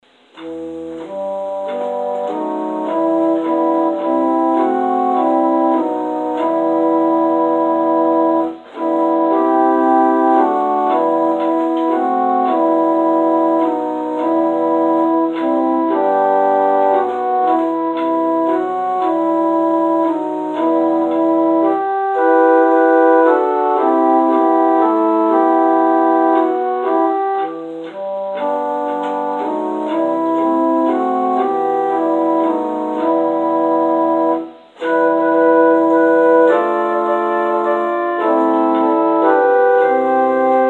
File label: Interlude